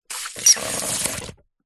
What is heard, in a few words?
foley balloon pump suck in water bubbles gurgle squelch; zapsplat